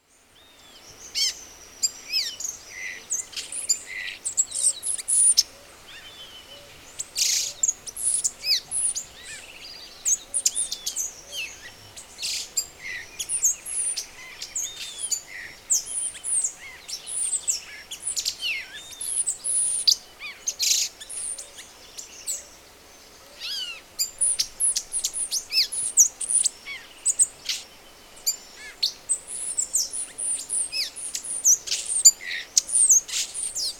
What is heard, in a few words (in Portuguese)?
gaturamo verdadeiro